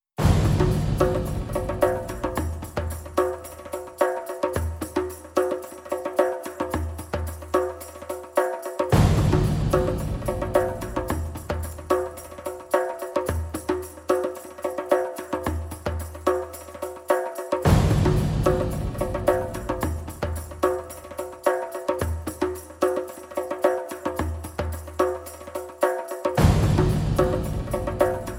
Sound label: perc only